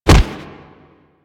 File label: Fighting Blocked Impact; Warframe; Weapons